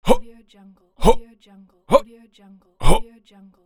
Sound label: male jump